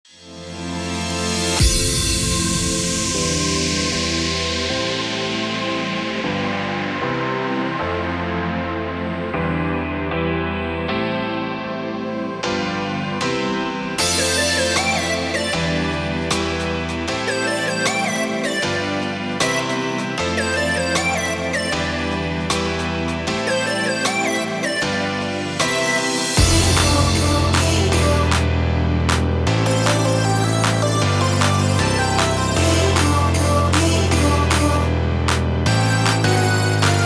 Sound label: Starting Tune